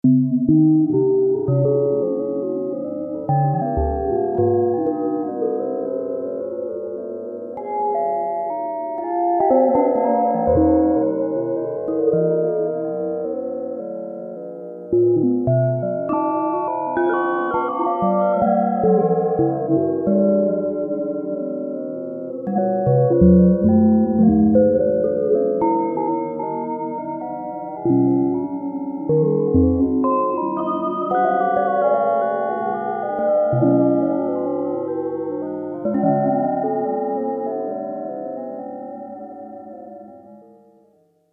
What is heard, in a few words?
creepy chimes sound